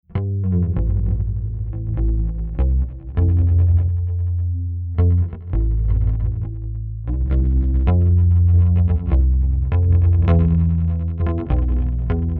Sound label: Soft Alarm Micropitch Basschoruses Bass; H9 Bundle; Plug-ins: Undulator (Soft Alarm) + Micropitch (Basschorus(es))